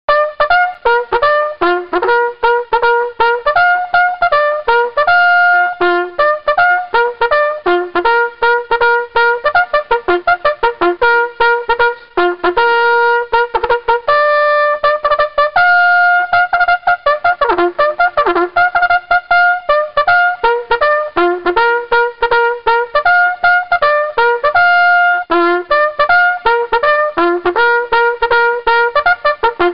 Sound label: To The Colors, bugle; BugleCalls